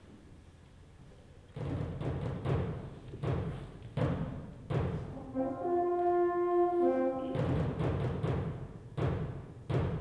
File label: Fanfare